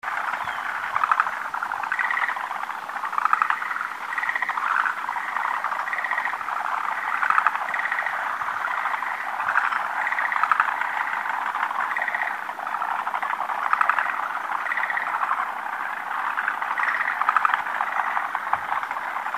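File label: moriao, forest green tree frog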